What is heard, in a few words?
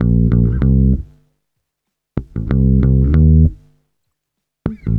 BASS, BASS GTR